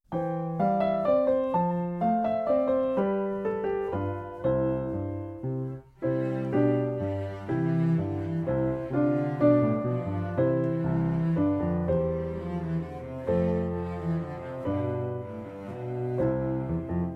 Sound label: Piano, cello; Suzuki Cello Vol 2; Minuet No 1